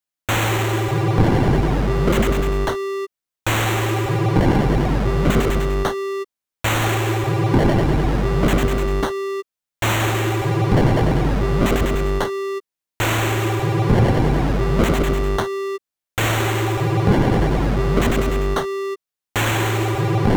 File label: beeeeeep